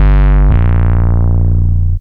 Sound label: Metal Bass - 6A; Jungle; Blu Mar Ten; Jungle - 1989 to 1999